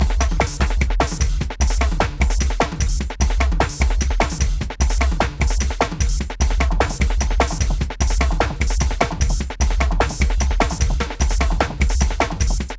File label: PHATT BEA